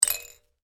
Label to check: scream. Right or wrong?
Wrong